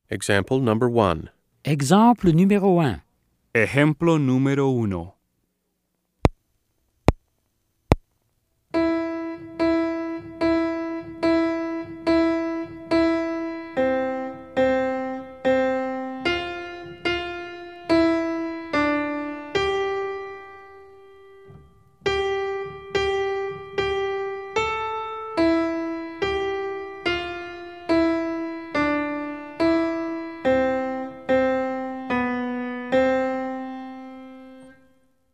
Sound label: Keyboard